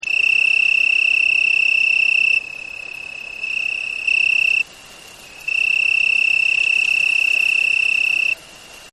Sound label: Crickets Wind; Nature Scenes; Insects; Animals; Partners In Rhyme